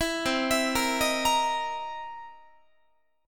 C 7th Sharp 9th C Guitar; C7#9 arpeggiated; 19,20,19,20,19,21

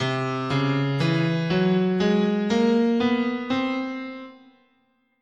C Enigmatic, scale

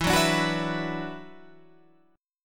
E 7th Suspended 2nd, down

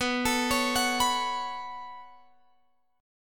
B 7th Suspended 2nd, Guitar, Open F; B7sus2; arpeggiated

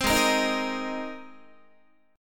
G sharp Major Add 9th G sharp, Guitar; G#add9 strummed; JGuitar

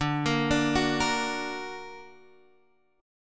D Augmented, D, down; arpeggiated; chord

